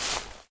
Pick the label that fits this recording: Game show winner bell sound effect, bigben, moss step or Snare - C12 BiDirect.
moss step